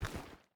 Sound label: footstep rubber boots stones walk; Rust